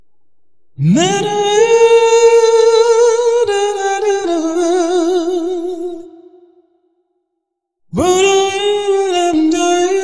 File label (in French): voix intro, La voix de l'intro